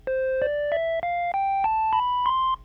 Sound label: Nord Lead 4 triangle